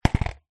sport skateboard small plastic childs down on ground